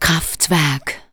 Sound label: vox, Techno Trance; Ueberschall